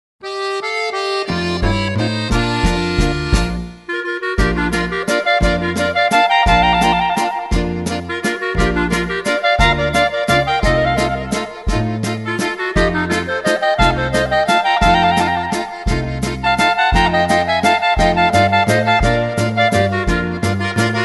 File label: Waltz